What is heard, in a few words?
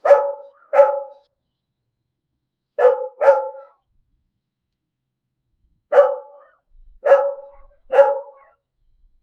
a puppy barking once only; dog